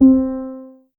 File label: plucked soft piano or ep; confident